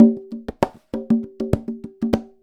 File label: Conga Loop (100BPM); musicradar